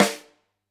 Lud Rim, drum

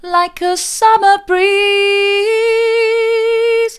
like a summer breeze mid, vocal

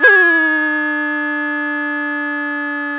Sound of a school bell

